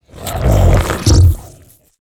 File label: creature crunch; video game; Bluezone Corporation